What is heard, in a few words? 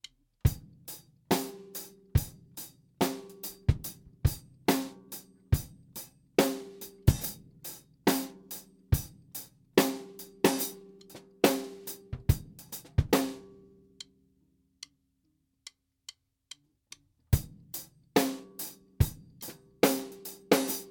Clean, Drum Test; Slingerland trommer, Washburn Falcon, Epiphone Casino, Ibanez